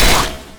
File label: attack; tidehunter